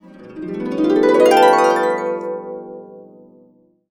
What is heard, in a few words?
B, Complete Harps; USB Soundscan vol.62; AKAI